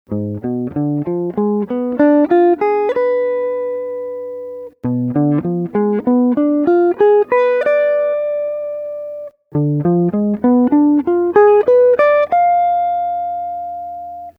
Dim. Arpeggio Wiederholung alle drei Bünde

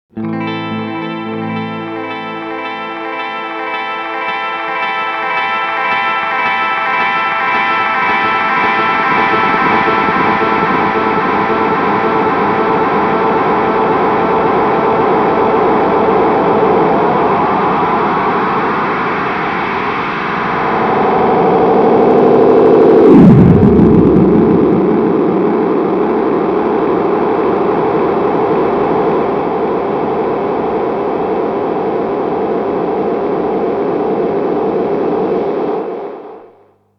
Earthquaker Devices Disaster Transport Oszillation

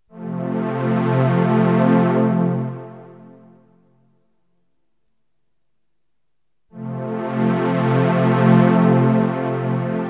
Flaechige Sound mit dem Strymon DIG und dem MicroFreak

